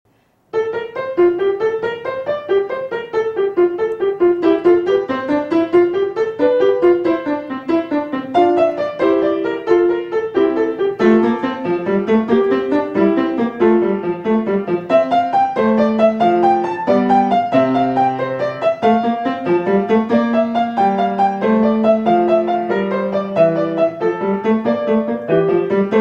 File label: verso fughetta; zipoli domenico